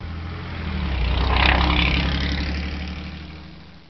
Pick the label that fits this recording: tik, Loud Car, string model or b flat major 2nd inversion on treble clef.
Loud Car